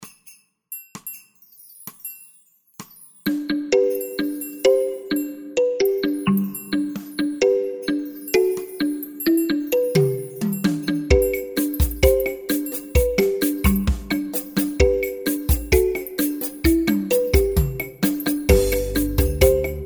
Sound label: Zouk Kalimba; Tsetse Music; tropical heat waves